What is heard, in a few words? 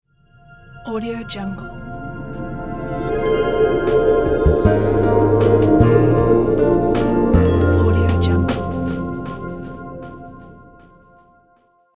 techy logo; qkmusic